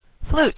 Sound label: flute